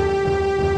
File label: music